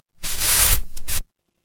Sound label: paper fabric fabric